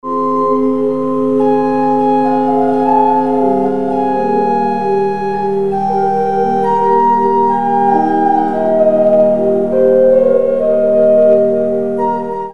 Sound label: Micro Organ; Mikrobuch; Microtones for Microchips